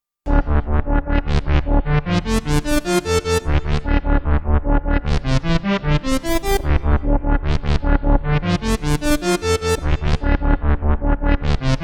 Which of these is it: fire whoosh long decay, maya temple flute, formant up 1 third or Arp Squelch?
Arp Squelch